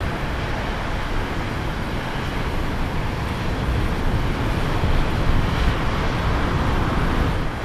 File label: distant ocean